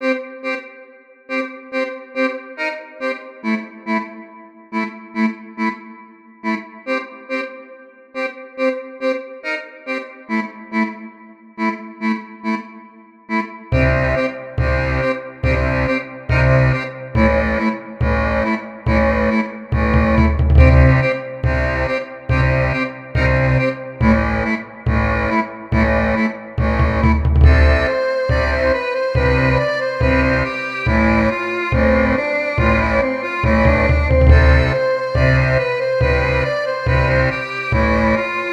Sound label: royal strings